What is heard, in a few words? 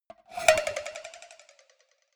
cowbell scratch hit move muted